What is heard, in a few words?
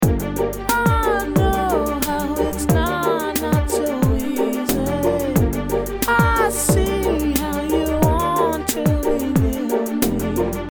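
main string with vocal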